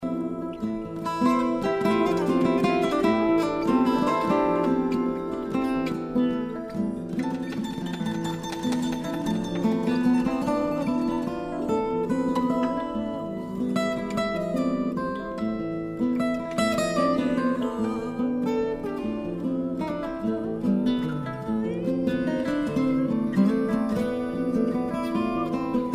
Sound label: guitars